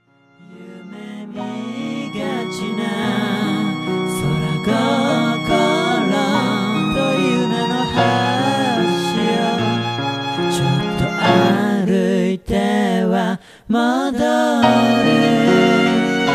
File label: triangle